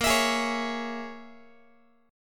A sharp Minor 7th Flat 5th A sharp, Guitar 4A,4E,5A,5D,5G sharp,6C sharp; strummed; x,18,19,18,17,x